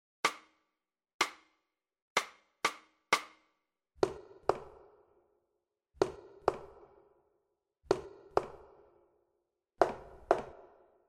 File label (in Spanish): Fuga Em